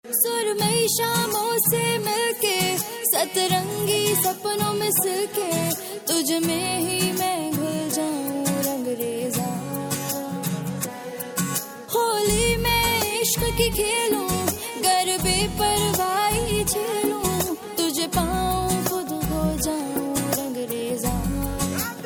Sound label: Ost pakistani ringtone